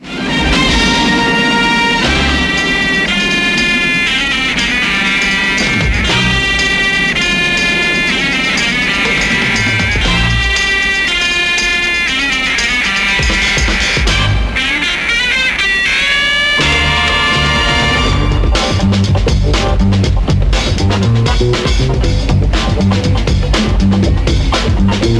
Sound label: Fuzz Theme